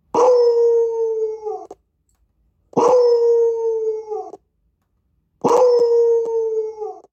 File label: Beagle notification